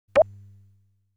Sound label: Time Plus Bonus